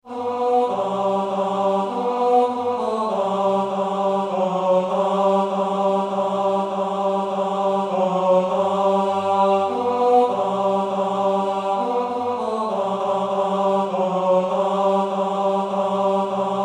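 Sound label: Tenor, choir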